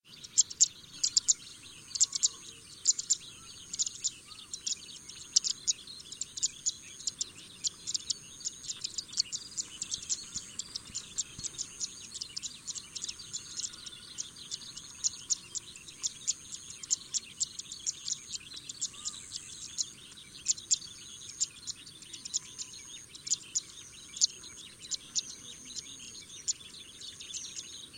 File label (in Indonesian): suara burung apung tanah